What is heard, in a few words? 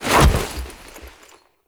Foley Melee Sweep; Warframe; Kahl